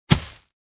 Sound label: jump